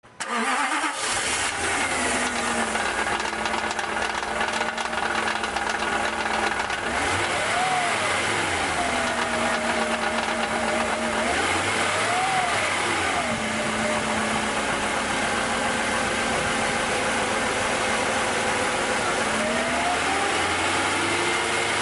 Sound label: rattle startup, x308; not timing chain; XJ XJ8 XJR